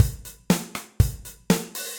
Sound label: Kit, drums; DeepSnareDynamics; Ableton; Session Drums Club